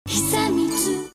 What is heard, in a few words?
sound logo; hisamitsu